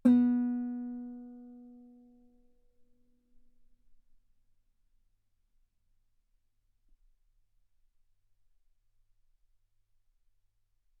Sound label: Guitar ff B3; sul B